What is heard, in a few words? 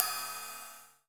RIVET, METAL PER; AKAI S6000 CD-ROM - Volume 1; PERCUSSION